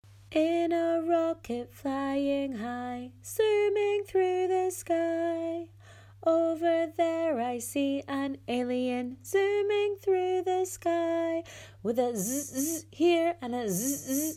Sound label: zoom alien